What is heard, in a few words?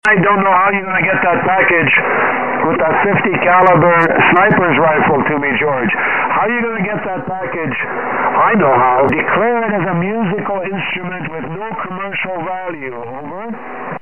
50 CAL SNIPER RIFLE